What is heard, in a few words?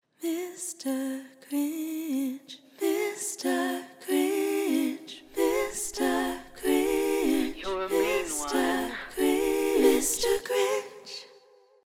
Harmony Play